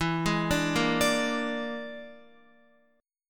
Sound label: E 7th Flat 5th, down, Guitar; E7b5; arpeggiated